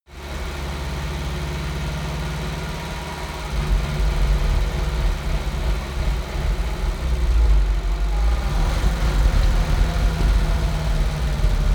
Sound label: car dacia slow driving long decelerate stop